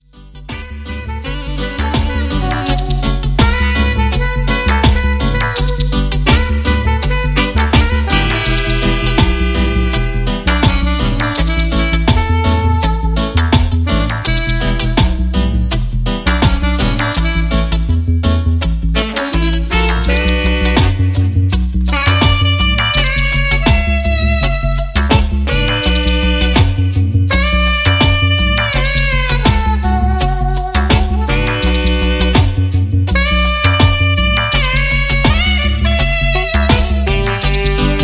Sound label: Storm Sax